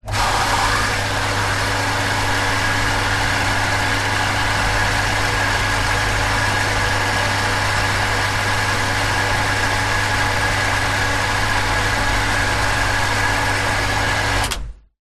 industrial swimming pool filter pump on off short burst